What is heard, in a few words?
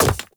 Hand Bomber Proj By Bounce; Warframe; Man In The Wall